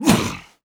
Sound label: vce land hard